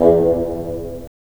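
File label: WND BN, wind bassoon; LA Composer vol. 3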